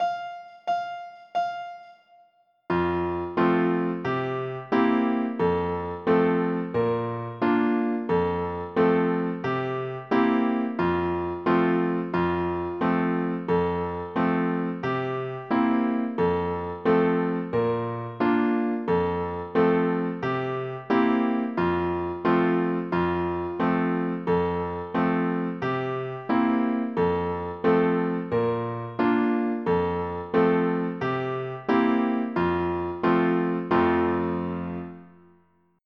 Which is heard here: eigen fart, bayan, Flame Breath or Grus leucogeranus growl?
bayan